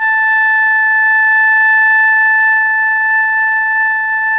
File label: SOPRANO A 4, Saxophones; PP; Greytsounds; Philip Wolfe David Crozier's Saxophones